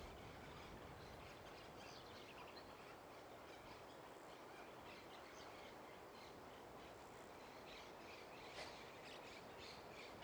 AMB EXT MEDIODIA VIVIENDA RURAL Flores UY

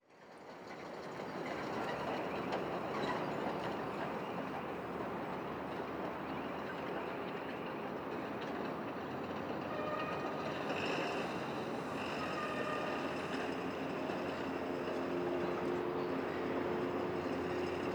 AMB EXT DIA Puerto de Montevideo grua

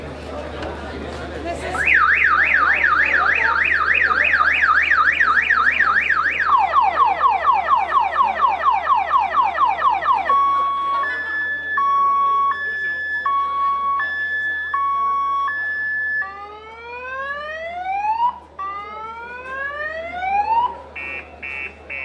ALARMA de AUTO

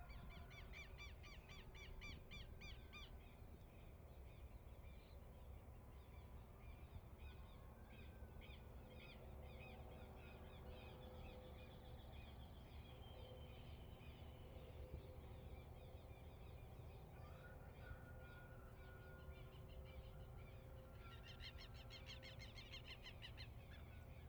AMB EXT AMANECER CAMPO Trinidad UY Generico

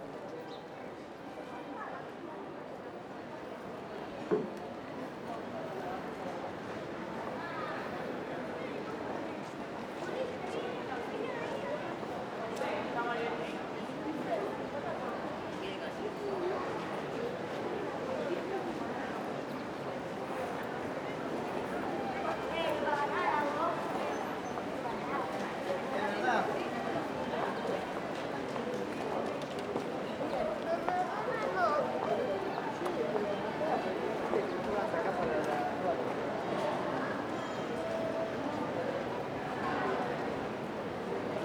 AMB EXT TARDE CIUDAD Peatonal Rosario ARG Generico

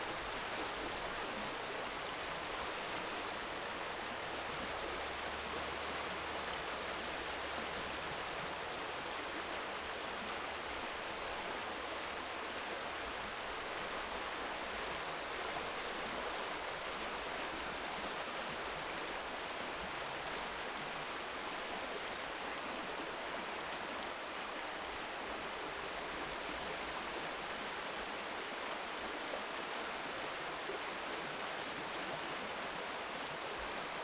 AMB EXT AMANECER RIO Represa Valle Eden UY Generico

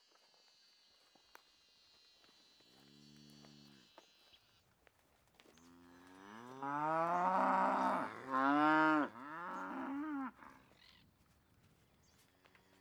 AMB EXT DIA CAMPO UY Vacas ganado

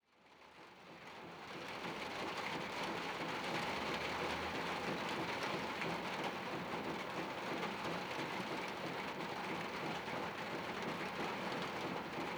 AMB INT BANO Lluvia y gotera techo chapa

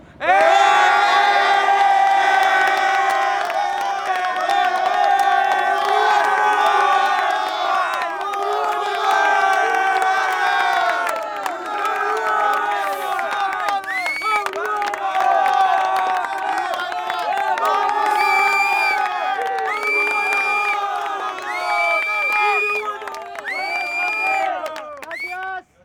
HINCHADA alentrando a Uruguay estadio gritos

